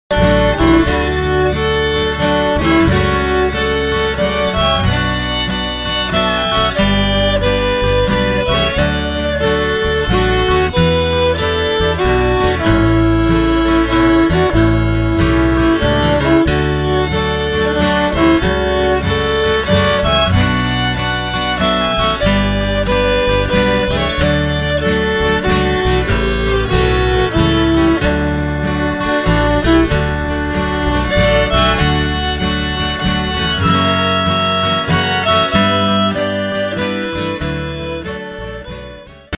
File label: Waltz